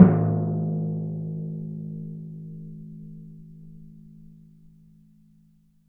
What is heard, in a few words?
TMP A#, Synclavier Percussion